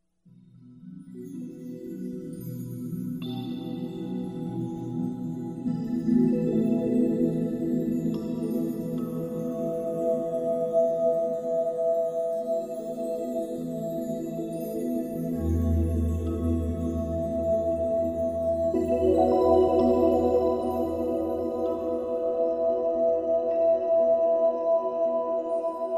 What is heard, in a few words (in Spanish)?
Meditacion Campanas Tibetanas